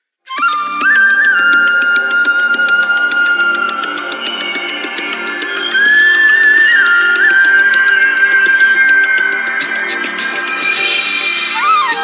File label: Ringtone, Samsung GT-B3313 Corby Mate